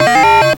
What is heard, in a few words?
FX, attacks